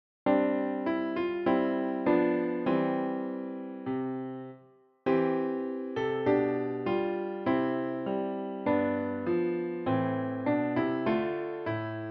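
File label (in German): Klavier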